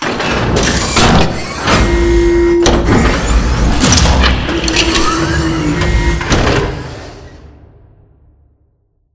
Assembly Wall Arm Close; Boomtown; Titanfall 2

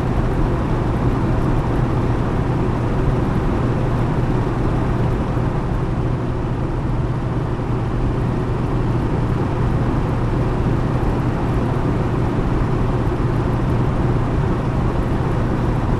industrial vent; boneyard; Titanfall 2

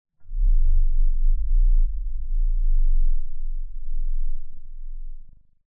Giant Floor Panel Assemble Final Lift LFE; Boomtown; Sideways Town; Titanfall 2

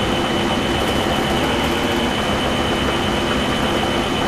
Prop Warehouse Prop Rack Move Mid; Titanfall 2; Boomtown

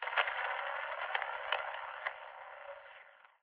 static; Titanfall 2

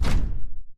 atlas footstep sprint; Titanfall 2

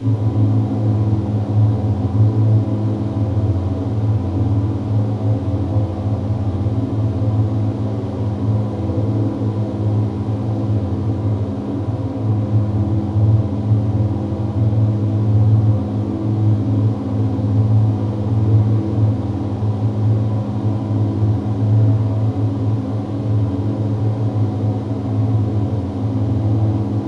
Fog Vent; Boomtown; Titanfall 2

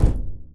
Footstep Jog Far; Titanfall 2

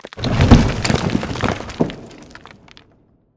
Assembly Plate Lift Impact Rock Debris; Boomtown; Titanfall 2

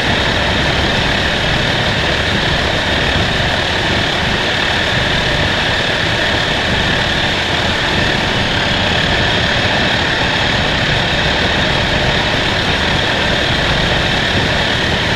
Prop Rack Small Move Dist, Warehouse; Titanfall 2; Boomtown

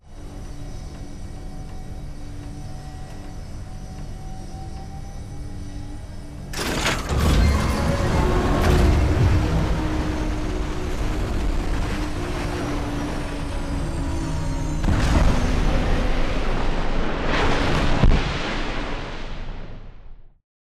fly in ship; commander; blisk; Titanfall 2

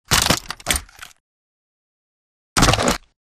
Dish Slide Player Rifle; Beacon; Titanfall 2

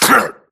grunt death neck; Titanfall 2

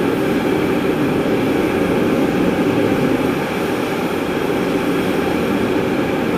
big steam vent; Titanfall 2

